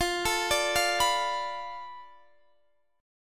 F 6th Flat 5th F down Guitar DADGAD, chord

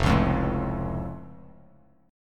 F Augmented, Bass Standard; strummed; 1,4,3,2; down

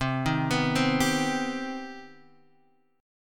C Minor Major 7th C Guitar Standard; arpeggiated; JGuitar

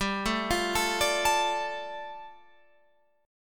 G 7th Suspended 2nd, Guitar Open F; G7sus2 arpeggiated

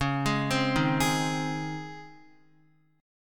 Db Major 7th Suspended 2nd, Guitar; 4E,4A,5D,5G,5C,6F; arpeggiated; chord shape; x,4,6,5,3,3

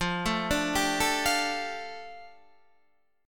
F 6th Add 9th F down Guitar Open D, chord

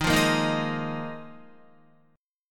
Eb Suspended 2nd Suspended 4th, Guitar; Ebsus2sus4 strummed; 10,8,10,8,8,11; JGuitar

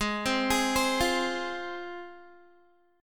G sharp 6th, Guitar; G#6; arpeggiated

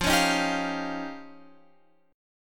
F 7th Suspended 2nd Sharp 5th, Guitar, Open F; F7sus2#5; strummed; JGuitar

